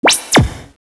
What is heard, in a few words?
ARROW